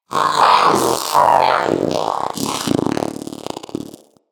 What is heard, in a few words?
Companion Vocal Idle Growl, Pets; Zanuka